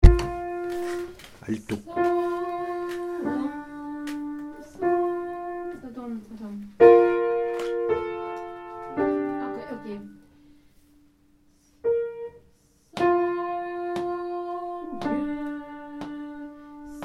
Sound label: Trisagion Alto